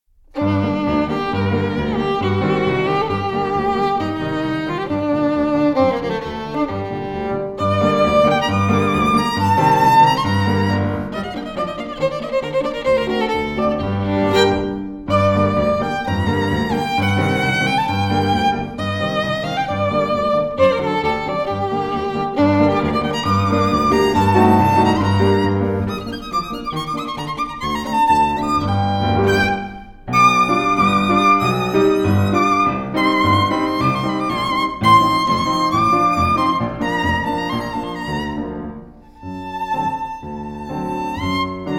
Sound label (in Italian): Duo Danza ungherese